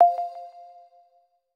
disconnect; Xiaomi MIUI 9.5

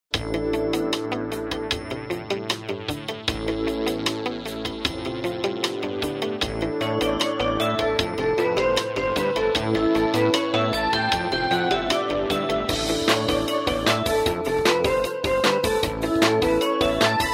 Grand Bazaar, Ringtones; Samsung SGH-Z370

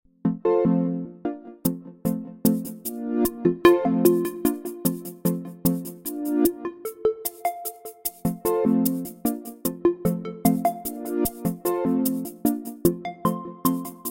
Ethereal Space, ringtones

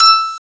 Rec Start

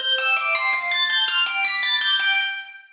The Windbells In A Dreamland

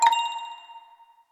Vibrate, notifications; Tecno Pova 7

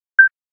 Beep; Windows Phone apps

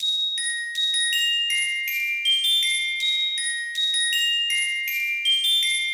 Alarm; Allview Soul X6 Xtreme

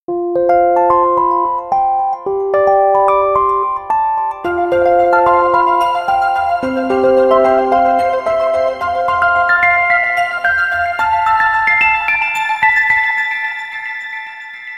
Rise and Shine, ringtones; Ziox Zi4004

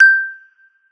Ding; OnePlus 11

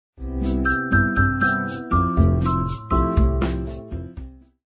Tone, Message

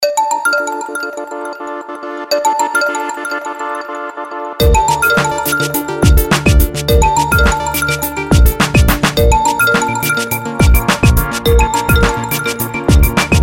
Chime; Coolpad; Dazen-X7

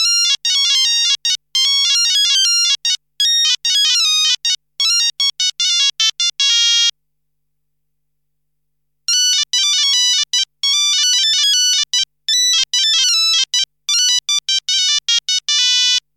Hurdy gurdy legato, Monophonic; Nokia; Noa Nakai

